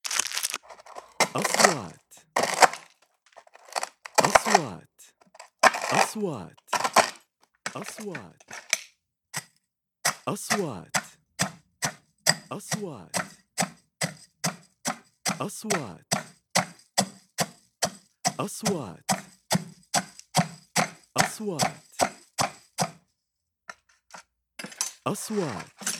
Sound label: Coffee Deck Grinding; kitchen